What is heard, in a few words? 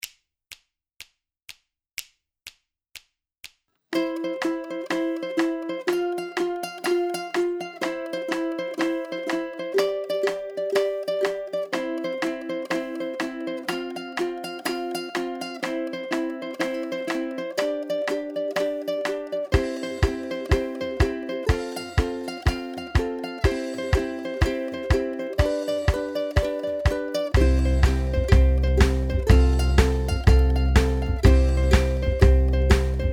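BACKING, ukulele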